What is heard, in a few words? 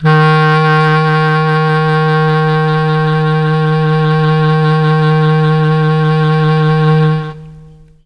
CLARINET D#2, Mellotron; Mike Pinder - Mellotron Archive CD; CHM CLARINET